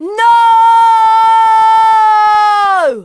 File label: Flying Car